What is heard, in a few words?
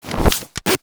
fabric and velcro